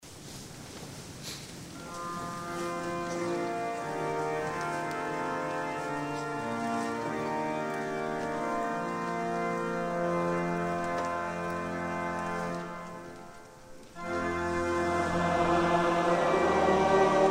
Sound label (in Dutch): Toezingen Ps 121; vers 1 en 4